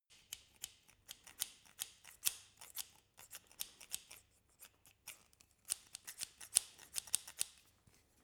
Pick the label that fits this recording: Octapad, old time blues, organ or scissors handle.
scissors handle